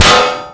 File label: metal solid impact hard; physics